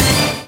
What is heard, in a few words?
Powerup; AtomicGameEngine